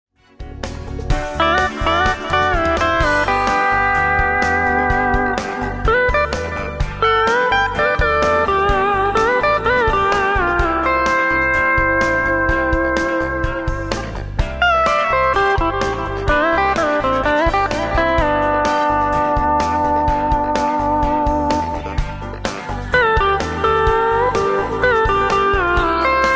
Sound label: steel